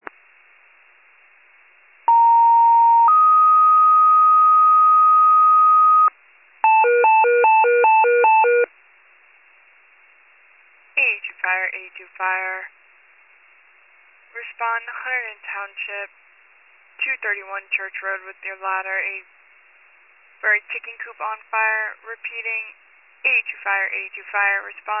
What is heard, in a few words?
fire, pager alert